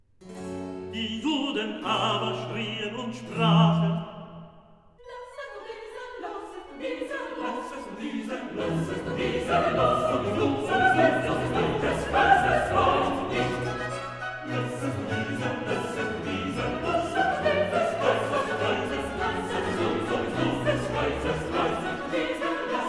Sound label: Recitativo, Coro; evangelist Die Juden aber schrieen; Lassest du diesen los; Klassika; Handel Masterworks